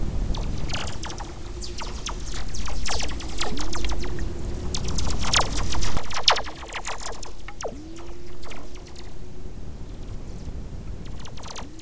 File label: WFR audio emfisis